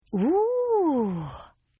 Gasp Oooh Female; Gasping; Partners In Rhyme

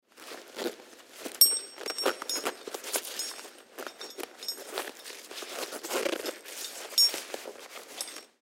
Fire Jacket, Fire Jacket Zip Up; Emergency; Emergency Vehicles

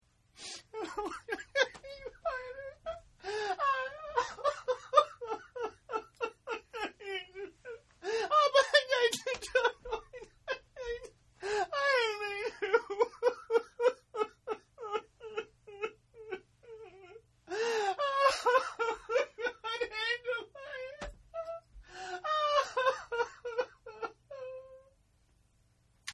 Crying Gibberish Male; Voice; Partners In Rhyme

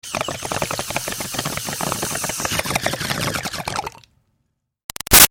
Bong Water Pin In-Out Inhale Bubbles Med; Bong , Water , Pin In Then Take Out , Inhale , Bubbles , Med

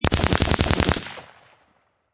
Machine Gun Colt Ar 15 Long Burst Close Range

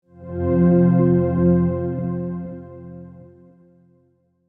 Multimedia Chime, Technology, Multimedia, Chime

